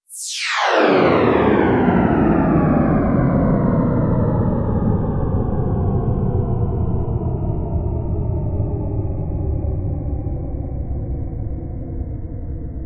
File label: FX Sweep Down; vengeance essential club sounds-3